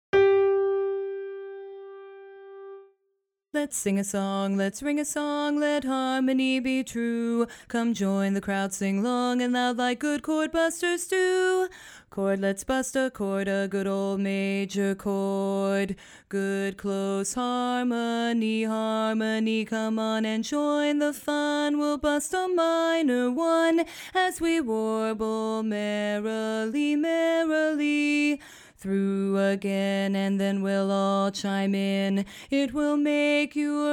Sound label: bass alone